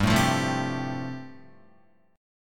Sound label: G 7th Suspended 2nd Suspended 4th, Guitar